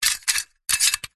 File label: office staple gun metal mechanism loader slide click